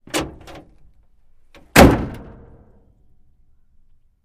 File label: Truck 2.5 Ton Front Door